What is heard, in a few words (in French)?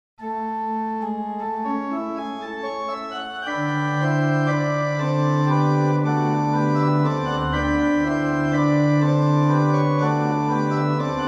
bourdon prestant reverb